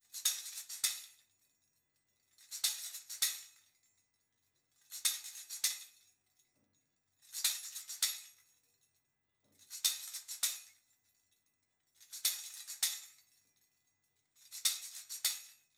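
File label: tambourine processed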